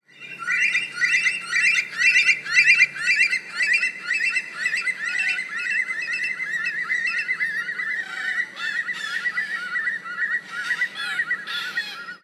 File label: Bush stone curlew